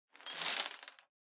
Bingo Machine Noise

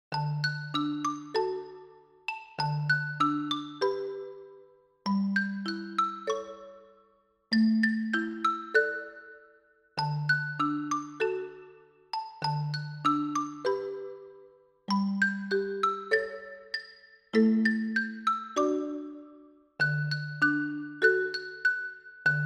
Fast; bgm; Jingle Bells

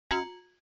OS Sound Notification

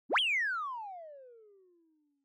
Motion Slide Mid

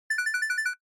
Censor Bleep Synth, Short

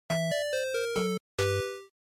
NES Action Game Over

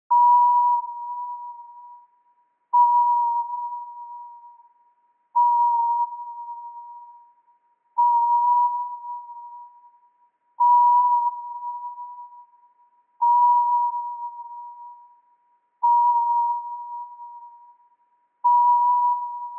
Retro Anime System Slow

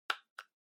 Kitchen Timer Button

